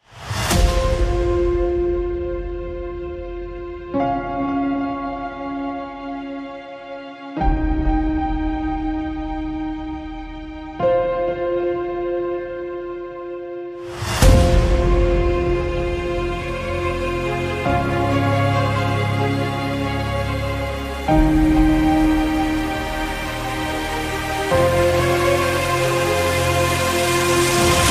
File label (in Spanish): Música Épica Trailer híbrido